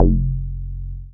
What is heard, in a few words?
Bass SH-101